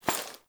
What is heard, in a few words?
Body Move